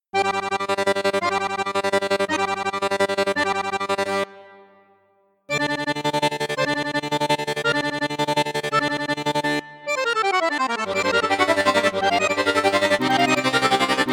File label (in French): registre basson flute boite flute piccolo, accordeon